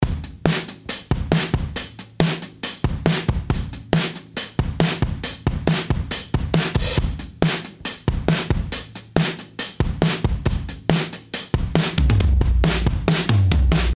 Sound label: Drums medium; Devil Loc